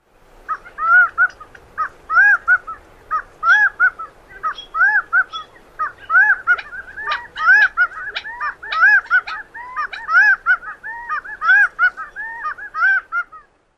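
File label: Gambel's Quail song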